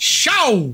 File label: xaaauuu